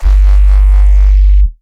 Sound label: bass one shot crispy fizz G-m